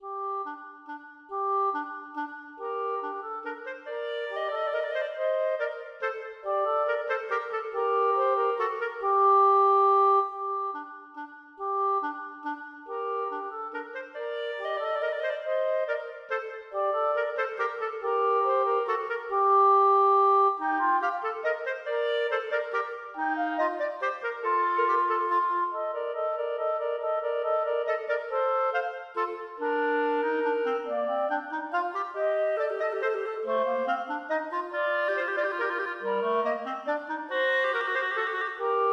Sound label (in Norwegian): Menuet for klarinettduett; Aamodt